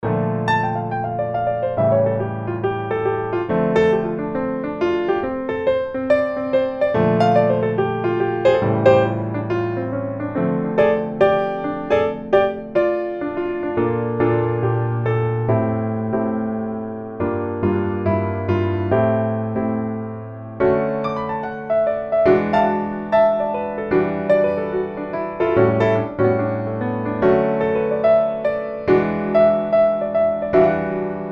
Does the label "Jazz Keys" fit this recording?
Yes